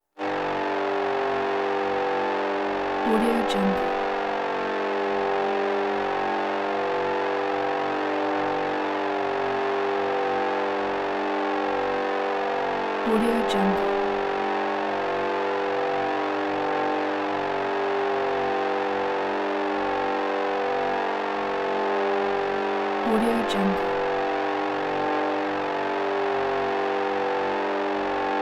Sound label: vision tone throbbing synth tone rizzy buzzy